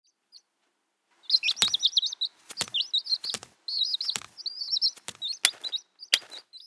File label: easter chicken idle